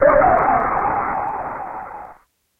Boss Defeat; Space Megaforce

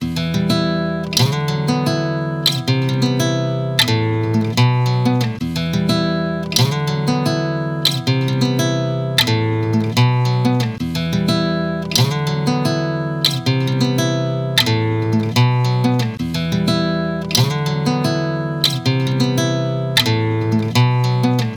result music; Sonic 2006 Proto

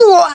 Damage Fly; SSB Brawl; Lucas

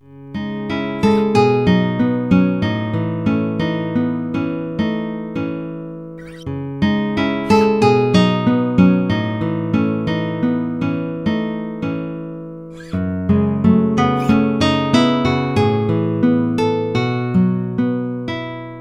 game over

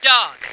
F2 dog; Unreal tournament

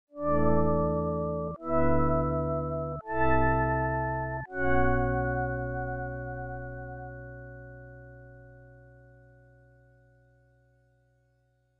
title jingle; Raimais

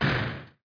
METAL SMASH; Frogger 1997

